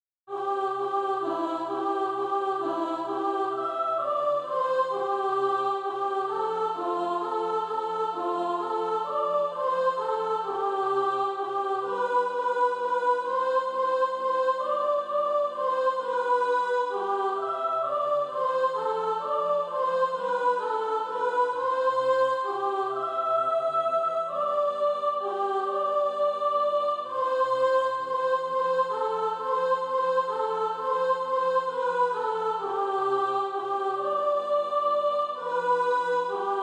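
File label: soprano, SATB Practise Music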